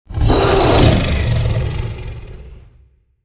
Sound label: Dragon Roar; shrek